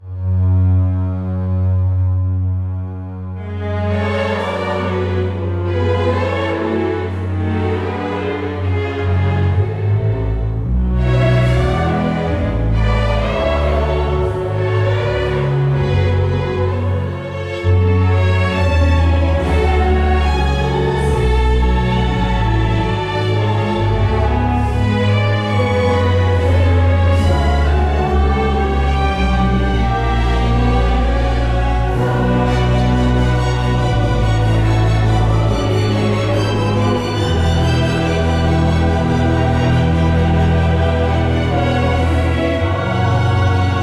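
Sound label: Church, G flat minor; 4/4; F#m; Choir + Strings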